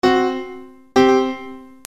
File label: dissonant; intervals